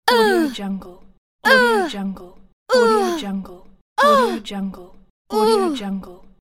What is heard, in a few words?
girl grunting uh